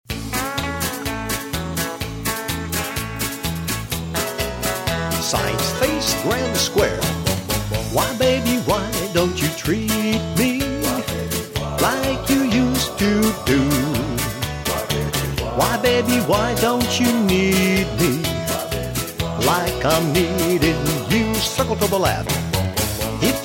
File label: vocal